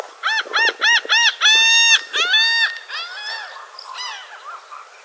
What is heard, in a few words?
Leucophaeus atricilla